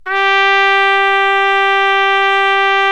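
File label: BRA TRUMPE, trumpet, brass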